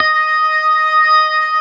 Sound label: STOPS, organ combos